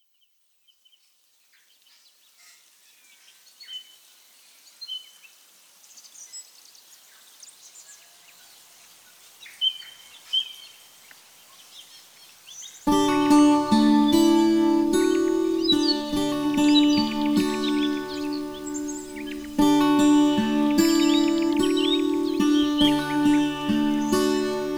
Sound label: ambiance acoustic guitar; smorgasbord